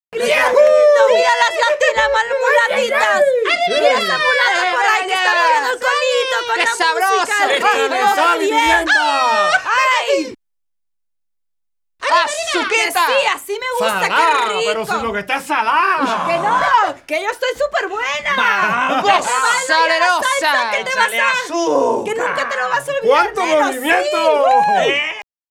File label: Salsa Picante; Masterbits; World Zone VOL-3